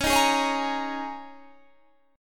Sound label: Ab Suspended 2nd Suspended 4th Db